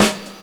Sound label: natural snare single hit A key; snare-drum-samples